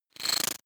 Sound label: data transfer splutter